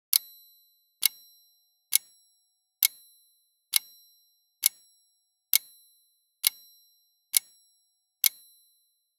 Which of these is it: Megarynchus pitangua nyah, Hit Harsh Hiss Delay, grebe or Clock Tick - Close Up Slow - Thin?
Clock Tick - Close Up Slow - Thin